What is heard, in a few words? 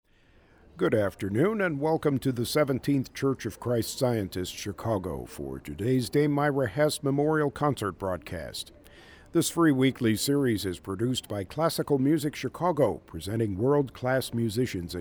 Piano; wfmt; Dame Myra Hess